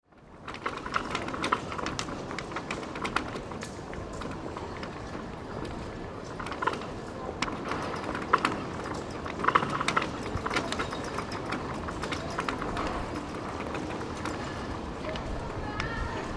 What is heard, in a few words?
Flag Flap and Rope wire Hitting Mast, on a windy day; Weather; Wind Sound Effects